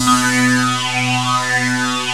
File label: ANALOG GIANT; OMI - Universe of Sounds